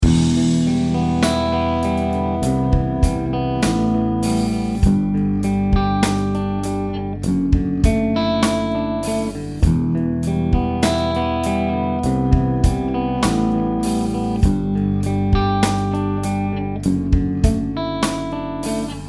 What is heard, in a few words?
Clean